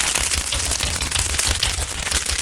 turtle walking; tortuga

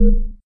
beep des leveldown

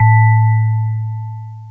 beep slow

